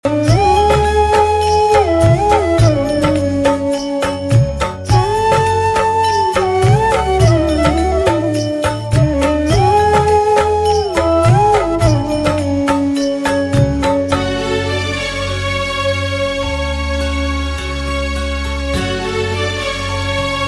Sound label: Saraswati Puja Ringtons